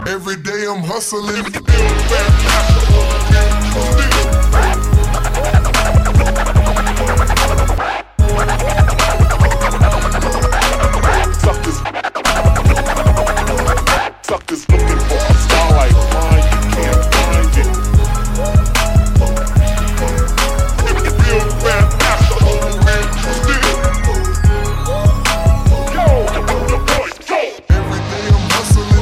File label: Hip Hop Beat